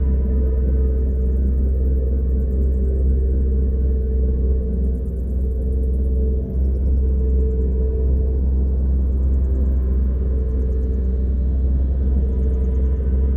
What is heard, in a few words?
machine empire civilship hum; Stellaris; synthetic dawn